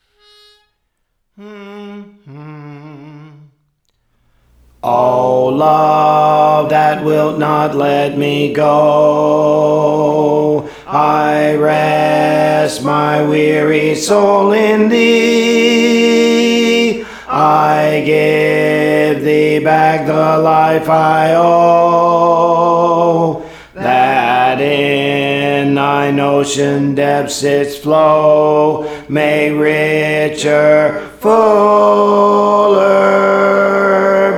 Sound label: Alto, Songs; Praise For The Lord Training